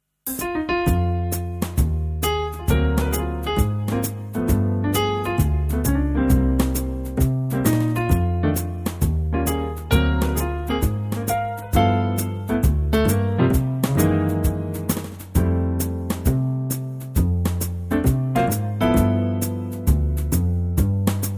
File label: swing F; vocal range - Bb to C